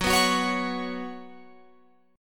Gb Suspended 4th Gb, down, Guitar, Open F; Gbsus4 strummed

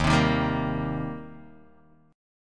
Eb 6th Eb down Guitar DADGAD

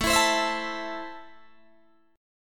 A Minor, Guitar, Open D

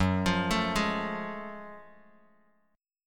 F sharp Minor Major 9th, Bass Standard; arpeggiated

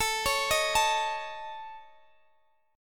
A Major Flat 5th, Guitar Standard; arpeggiated

